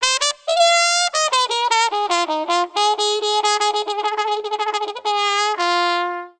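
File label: trumpet fx; Musical Instruments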